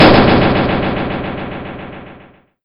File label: Opentanker; tempest 4000